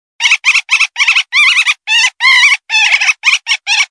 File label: Flicker